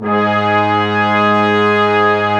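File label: Section, Brass Large Ensemble long; Roland L-CDX-03 Disk 2; Orch.Ensembl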